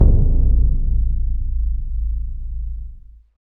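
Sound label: orchestral bass drum; Orch Bs Drum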